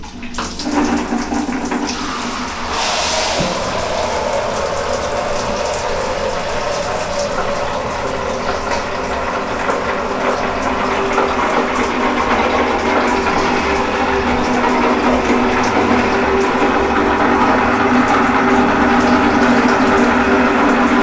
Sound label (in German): Dusche; Fieldrecordings; Brasilien; Bertonis Dusche